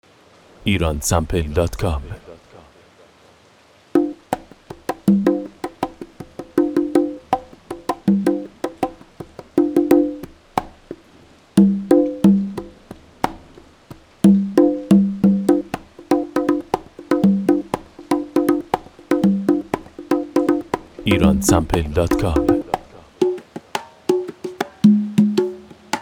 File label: Conga